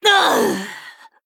Female Human Death

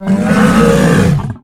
Clefthoof Attack

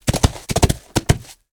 Four Hooves

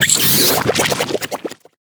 Green Jelly Death

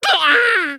Male Murloc Death

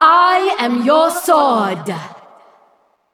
Female Valkyrie Attack

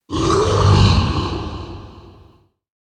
Cosmic Creation Death

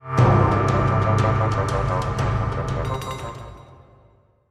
Gnome Play Stinger

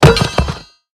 Mining Pickaxe Underlay Death

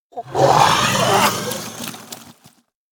Blistering Rot, Attack

